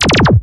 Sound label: mech boss laser; terraria